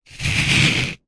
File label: nature ice block slide on ice; zapsplat